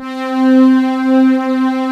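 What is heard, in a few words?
ANALOG PADS; Pro-Rec - Synthsonic; TRINITY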